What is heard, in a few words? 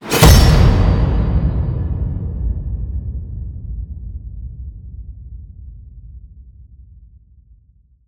HIT Massive, Cinematic Hits Designed